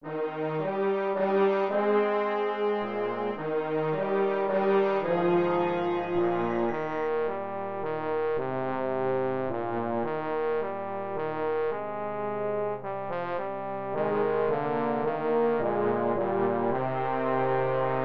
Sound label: Celtic Lullaby